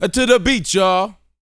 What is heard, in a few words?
VOCAL F; ILIO